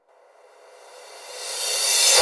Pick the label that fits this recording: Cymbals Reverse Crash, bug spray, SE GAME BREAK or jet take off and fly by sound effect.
Cymbals Reverse Crash